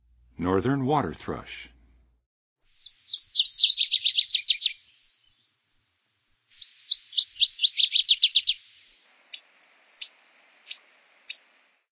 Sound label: Northern Waterthrush; North America; Western USA; Western USA Songbirds